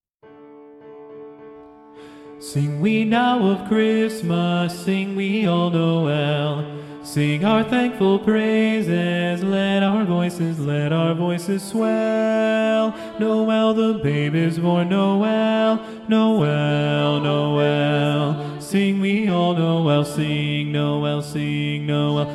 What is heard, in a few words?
BASS SATB Bass Predominant; Sing We Now of Christmas